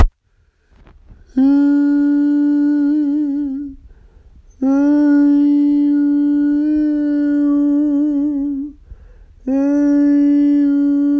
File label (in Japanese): オープン ロウ 女性